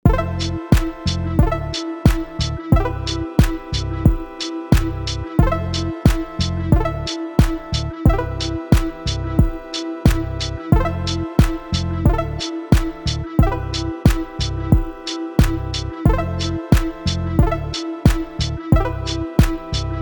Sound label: upbeat n uphill